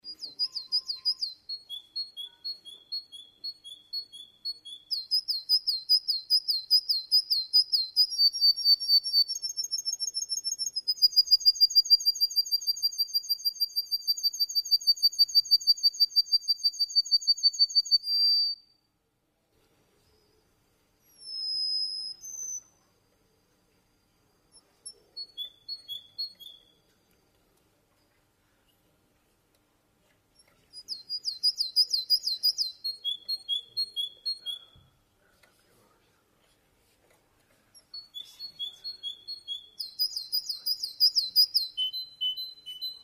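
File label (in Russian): красивые звуки пения канарейки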